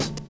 OLD SNARE; Univers Sons

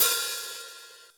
Cym Ride, Yamaha PTX8

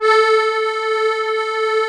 STEIRI A 3, STEIRISCHE

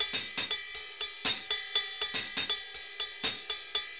RIDE, MERENGUE; Best Service ProSamples vol.14; AKAI

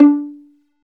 STR VL PIZ, Chamber Pizz; Roland L-CD702

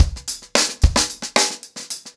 Hip Hop 110BPM, acoustic drums drumloops

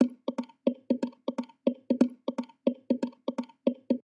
triplet hit loop 90BPM

